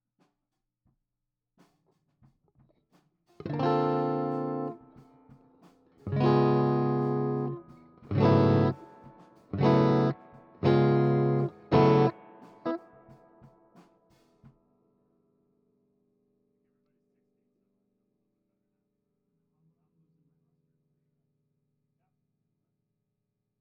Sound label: GTR R84